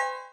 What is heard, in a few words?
chord